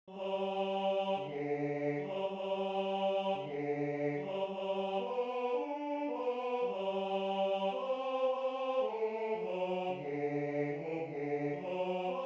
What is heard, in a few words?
Bass I; I schwing hin